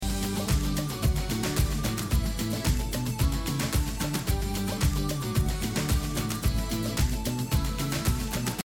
level; Dora and Friends - Legend of the Lost Horses

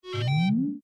UI Button; The Powerpuff Girls - Mojo Mayhem; NextlevelGames

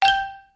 countdown; Looney Tunes - Recess

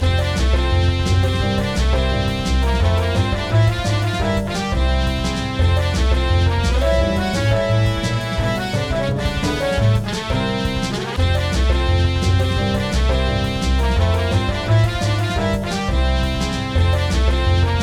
music menu; Tom and Jerry - Freefalling Tom; NextlevelGames

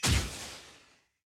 sfx add ingredient; Dorothy And The Wizard Of Oz - Cookie Magic

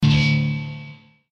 fire guitar glitch; Adventure Time - Elemental; ThrillGames

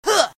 attack; Adventure Time - Break The Worm

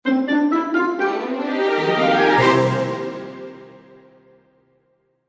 won level; Food Farmer

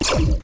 overflow shoot water short; Ben 10 - Hero Time Game; ThrillGames

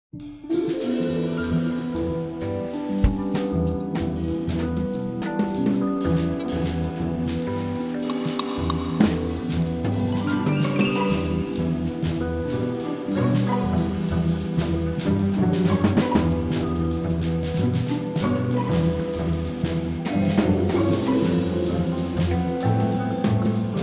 menu; Bunnicula - The Cursed Diamond

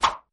change lane; Looney Tunes - Recess

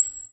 torch; dinkette; Be Cool Scooby-Doo! - It's Dark Out There; ThrillGames

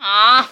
hit; charlotte

